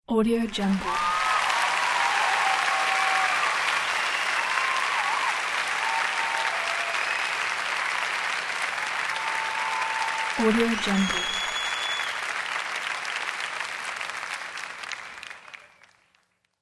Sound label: large amphitheater audience applauding; sound-ideas